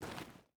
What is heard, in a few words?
footstep boots stones walk; Rust